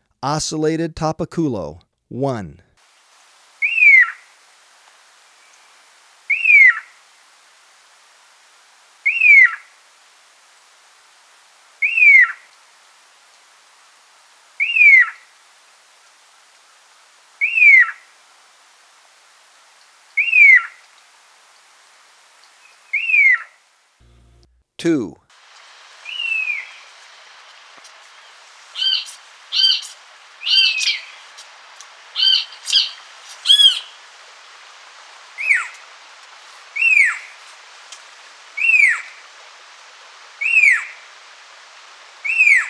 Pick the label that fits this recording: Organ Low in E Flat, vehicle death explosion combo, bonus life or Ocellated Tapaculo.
Ocellated Tapaculo